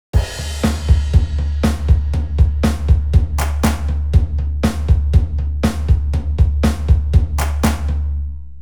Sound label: drum